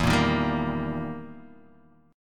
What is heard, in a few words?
E Minor Major 7th Flat 5th, E, down, Guitar, DADGAD